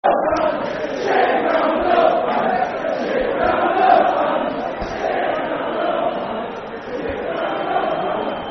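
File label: Shit Ground, No Fans Football Chant (Soccer Song); Bradford City Bcfc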